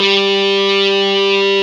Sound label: GTR Fuzz, Dirty; Club-50 - Foundations Roland